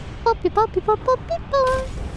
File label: Singing